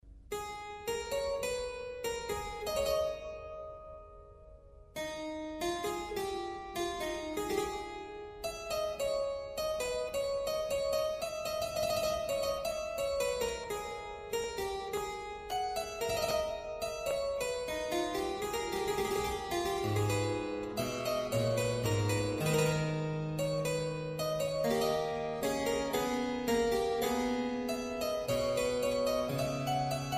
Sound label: Concerto in C major; BWV977 after an unknown German composer (Telemann)